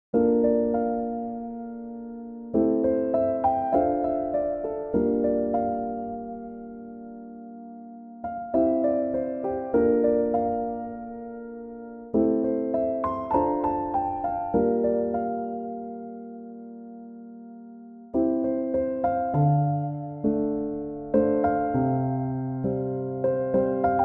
chill piano instrumental

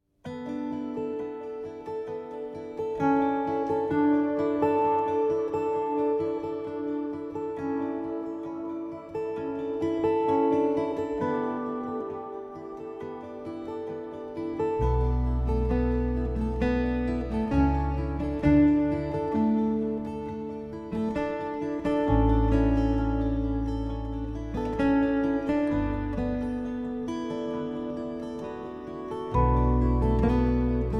Acoustic Instrumental

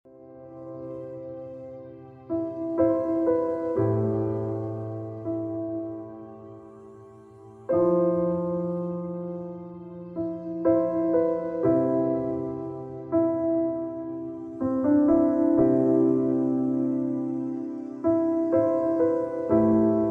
Piano Relaxation - Piano Dreams; hotusa spa

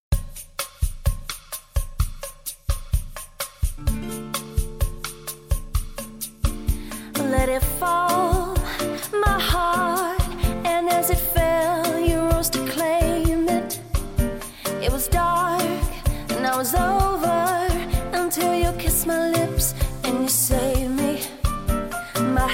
Bossa Nova Version

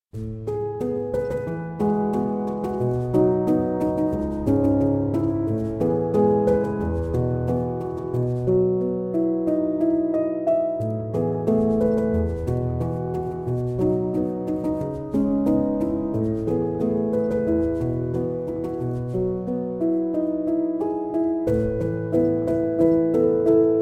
Piano Instrumental

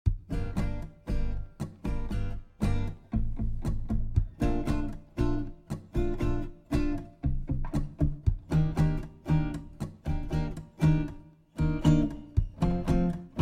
acoustic instrumental

